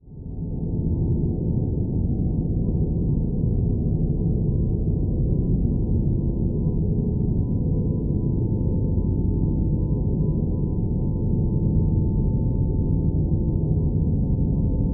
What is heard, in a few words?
Drone Deep Dark Metallic Rumble; Production Element Imaging; AdobeCC